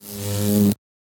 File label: wasp stop